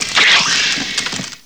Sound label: chestburst, alien; sven; amckern; avp10-guns